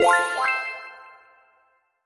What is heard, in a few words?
medium win; whos the bride